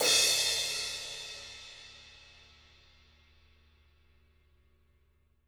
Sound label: crash, Living Drums, JZ CRASHES; Sampleheads; Peter Erskine